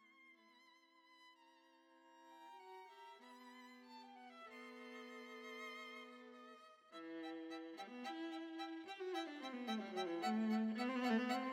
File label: allegro moderato; ludwig spohr